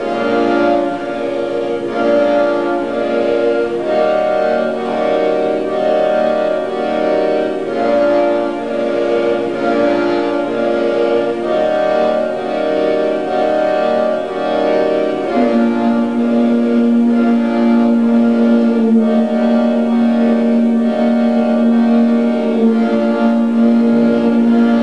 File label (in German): AKKORD; Kunsthaus Tacheles; ziguri2